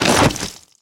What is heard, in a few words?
zomb body impact; kfsounds; css